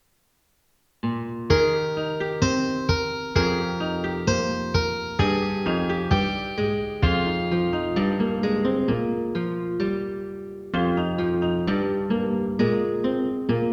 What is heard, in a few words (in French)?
petite piece en mi bemol majeur